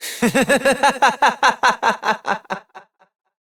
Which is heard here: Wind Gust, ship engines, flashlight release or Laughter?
Laughter